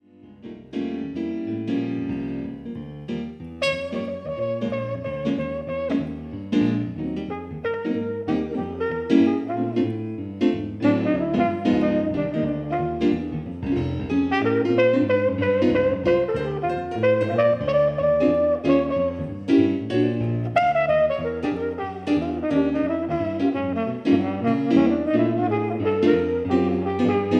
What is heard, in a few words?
blues piano